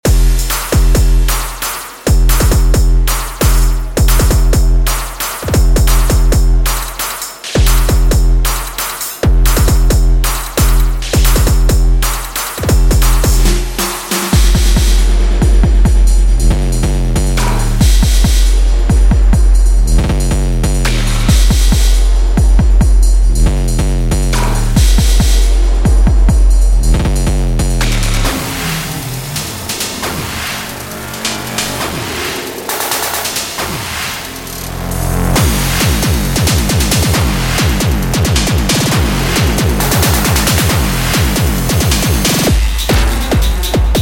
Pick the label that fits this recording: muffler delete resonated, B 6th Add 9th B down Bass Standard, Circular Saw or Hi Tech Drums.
Hi Tech Drums